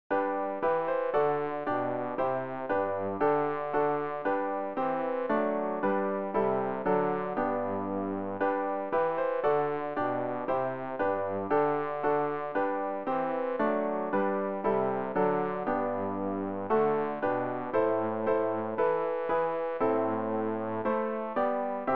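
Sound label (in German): bass, Übehilfen für das Erlernen von Liedern; ref gesangbuch; rg 175 sorge herr fuer unsre kinder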